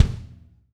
Titey Kick; Midnight

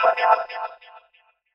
ELECTRO FX 140

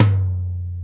LOW TOM

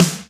SNARE; RadioactiveSandwich - Homunculus

